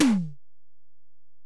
Tom, Alesis DM-PRO, Drum Machines, Toms

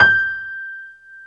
PNO G5; AKAI S-Series CD-ROM Sound Library VOL-2

